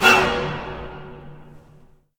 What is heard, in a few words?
zombie stinger; DeadFright